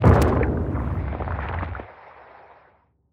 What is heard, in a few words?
explosion, Hammerhead matriarch